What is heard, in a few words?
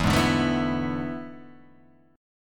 E 11th, Guitar, Open G